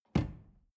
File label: drawer medium close lock